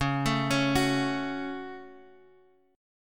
C sharp Suspended 4th Sharp 5th, Guitar, Half Step Down